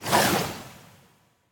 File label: umbrella throw fire; smite; vamana